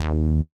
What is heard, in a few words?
D#3 bass